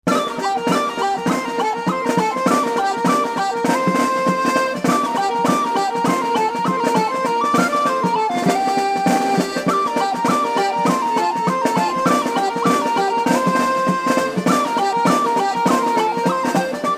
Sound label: Dragon Reel; Produced by Maestro-Matic